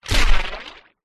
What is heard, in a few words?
science fiction impact alien body hit hard crack squelch bloody